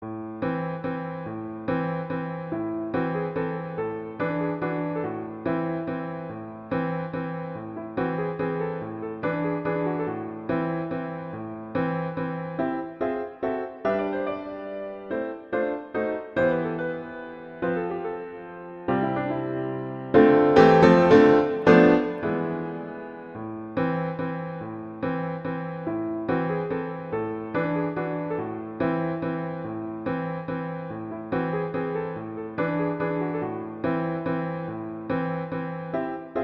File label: Waltz; Grieg; Lyric Pieces